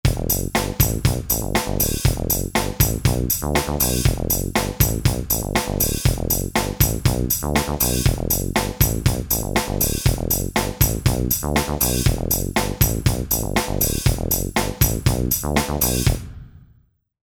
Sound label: Funk